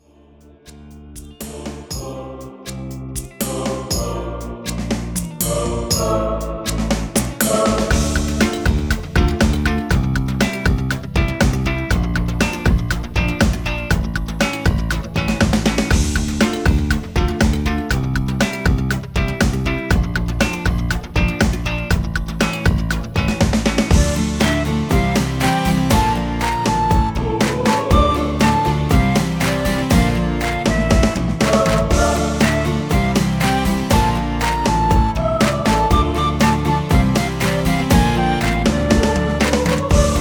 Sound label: rap (flute); version instrumentale